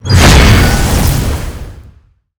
trap dark spwn

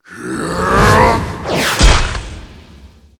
kentauros, monster

